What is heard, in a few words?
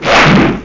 SFX flash